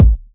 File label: sharp kick single hit a key; kick drum samples